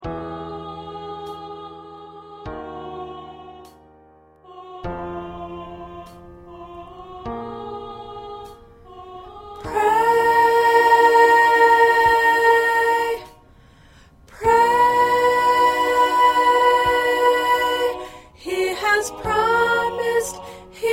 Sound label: Soprano part